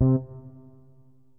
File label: bass